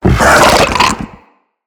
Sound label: Infested Ancient Run Breathe; Enemies